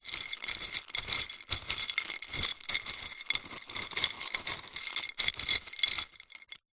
food savory snack wasabi peas in ceramic bowl hand in movements; zapsplat